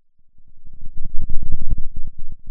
Sound of a thunderstorm, center

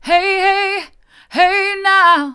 hey now hard, vocal

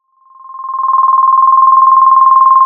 pop, Sound of a phone ringing

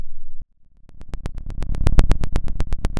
Sound of a fire crackling

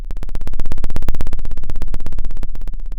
Sound of a plane taking off

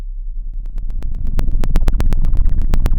pop, Sound of a fire crackling

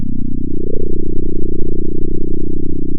pop, sound of a small motorcycle

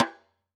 Pearl Piccolo Side Snr Off, drum

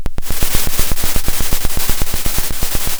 Sound of a drum roll

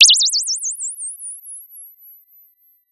Sound of a bird tweeting nicely

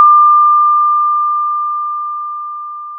Sound of a school bell

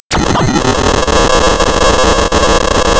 pop, tearing paper

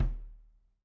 Loose Kick

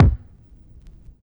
Vinyl Kick, drum

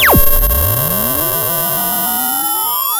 sound of a bike bell

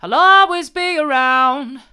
i'll allways be around mid, vocal

